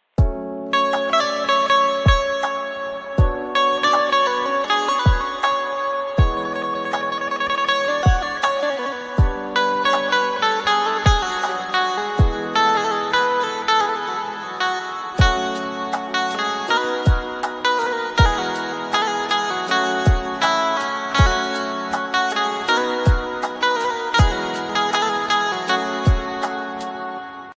banjo ringtone lofi mix instrumental ringtone bhakti bhajan ringtone